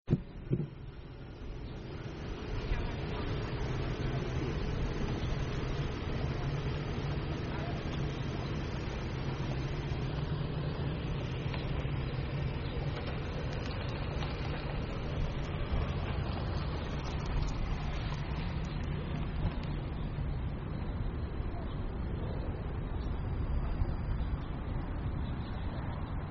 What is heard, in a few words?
soundscape